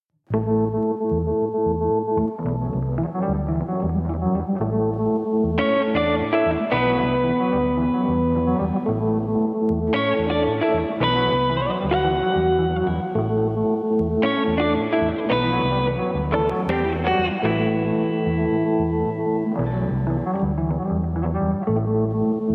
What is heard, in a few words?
riff